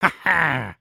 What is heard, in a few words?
Attack Idle, creatures organic